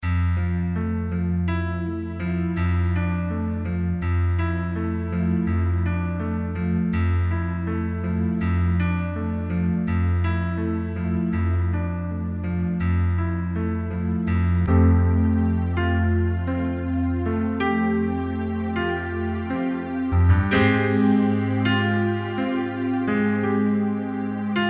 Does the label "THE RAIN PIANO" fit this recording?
Yes